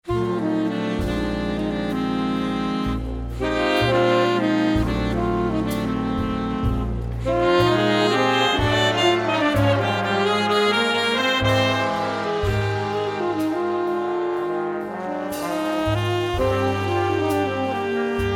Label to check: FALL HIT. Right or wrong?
Wrong